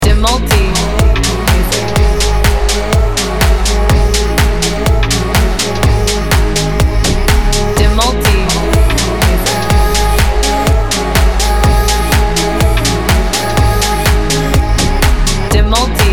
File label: instrumental edm; Track ini menghadirkan build-up panjang yang menahan napas, lalu drop yang meledak; Ada saat di mana suasana perlu dilepaskan.